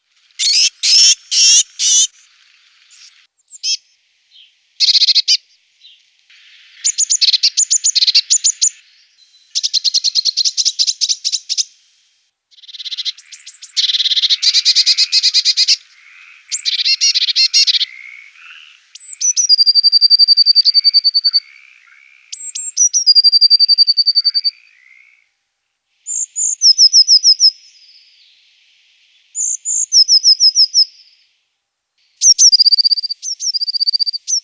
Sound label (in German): Blaumeise, vogelstimmen; vogelstimmen-trainer